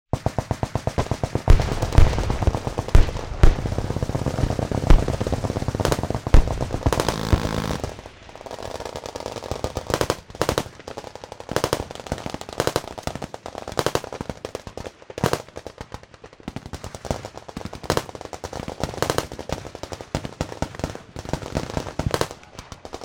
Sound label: Battle Military Heavy Guns